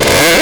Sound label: Synths E; Vengeance Samples